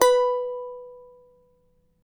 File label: charango B3, guitars; Spectrasonics; Hans Zimmer Guitars VOL-2